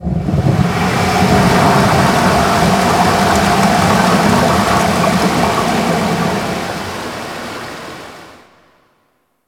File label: Infested Water Drain; Gameplay; Warframe; Corpus Infested